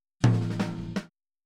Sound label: Boogaloo Fill Tom Rack, 82 BPM; Sonic Foundry; Drums from the Big Room The Mixes